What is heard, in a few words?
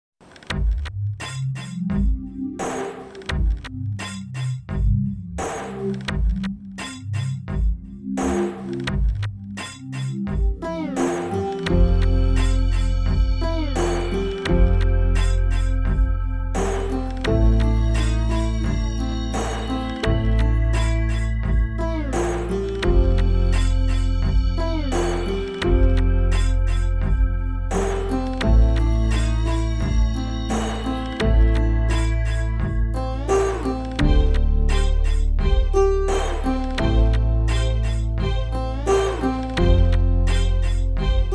garage beat